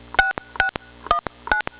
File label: dtmf